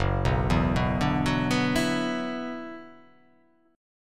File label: F sharp 7th Suspended 4th Sharp 5th F sharp down